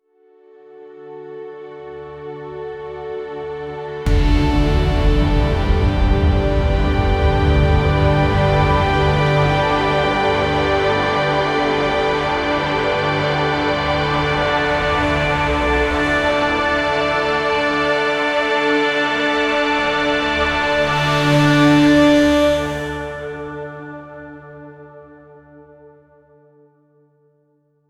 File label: emotional orchestral pads